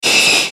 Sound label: static metal; Static Metal Scrape; Production Element Imaging Element Accent Transition; Blastwave; Static Sound Effects